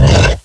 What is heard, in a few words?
Pound Land